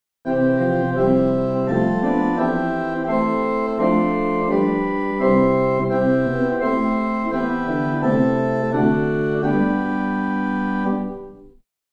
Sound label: Gt Principal8 Octave4, organstops; First Baptist Riverside